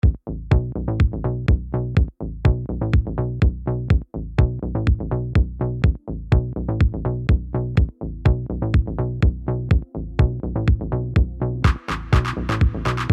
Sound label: bass-drum patterns